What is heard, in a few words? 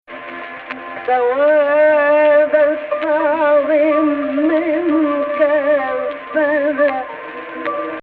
AHH, Egypt Rast